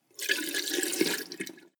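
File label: sink water soft, bathroom; haus-garten; badezimmer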